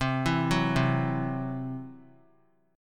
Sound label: C-Major Flat 5th; arpeggiated